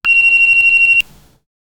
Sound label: short crisp, financial